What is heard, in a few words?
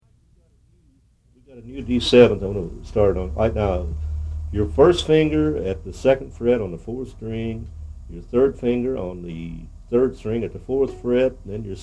Chromatic G Progression